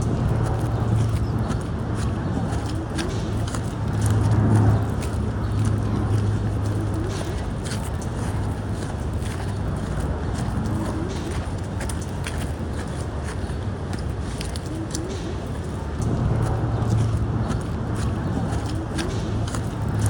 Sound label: Neighborhood Soundscape; summer institute 2026